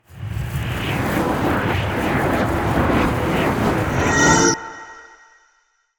Ice Spell Freezing Slowing Polar Vortex; Arctic Aura

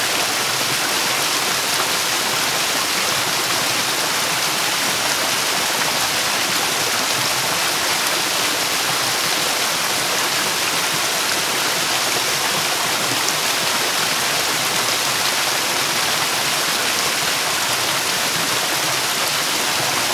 Water, Small Falls; Inquisitor 3

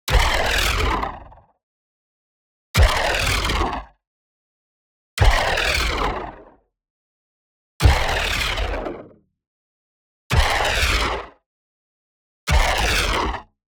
IMPACT WHITE NOISE Crunchy Grit Grind; boom library; Anime Essentials

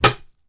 wood skull hit; DeadFright

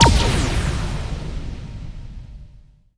POW; XXL 800 Production Effects

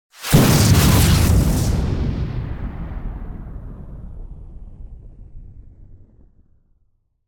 Pipe Explosion - Some Glasses; Hollywood Edge; sound designer's toolkit v2

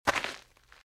Footsteps - Gravel - Walk - Left; RadwinIsland

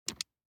DICE on Neoprene, Grab, Standard, Three Dice; Samples; ArticulatedSounds; DICE Sfx Party (Extended)

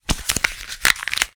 Index Card Crinkle; Office Supplies; 1001 Sound Effects

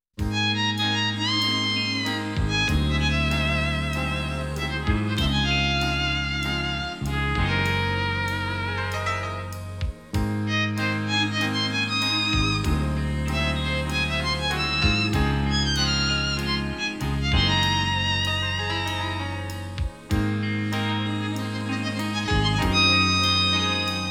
Slow Tempo 'romantic', music

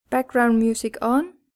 background music on; RadwinIsland

